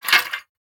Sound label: metal gate unlock